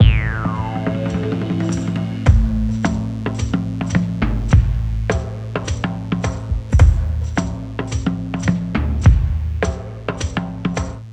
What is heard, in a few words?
folk